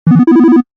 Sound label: Office Ring Lo Shift, Business Rings